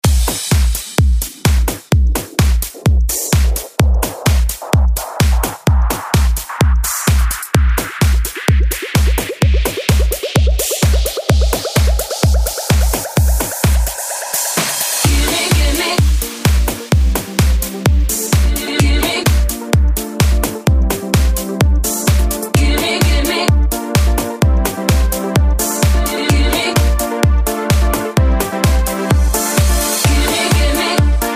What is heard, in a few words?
128 bpm; Freshcobar